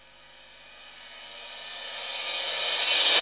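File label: crash reverse, Dance Mega Drums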